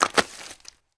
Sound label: Footstep water light